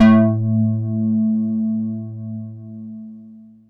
Chorusio a3, Korg Poly-800

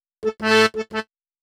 Accordion; Cinematic Funk; Sonic Foundry (Sony Creative Software); Cinematic Funk Urban Tone Poems

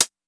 909 CHH; Wizoo; Platinum 24 Electronic; HHAT

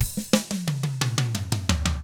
116 SHUFFLED, Drums; ILIO; Double Platinum Drums 1

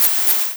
Perc Hit, Noise; noise hiss crackle; Noise Perc Hits

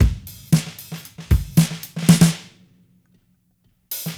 Cruisin' Disco Drums, 70s Analog Funk; Sonic Foundry (Sony Creative Software); What it Is!

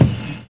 live kick; Univers Sons - Kitch 70s

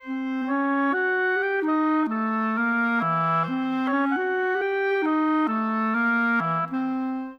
Clarinet Oboe, Orchestral; Sonic Foundry (Sony Creative Software); Orchestral VOL-2

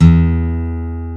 ACOUSTIC G; 12&or6 String Gtr; E-MU Producer Series Vol. 2; More Studio Essentials; Composer

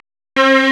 STRNG; CMP - Analogon

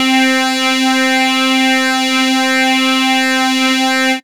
Synths Note C

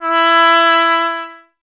BRS TP E 0, Trumpet; Miroslav Vitous

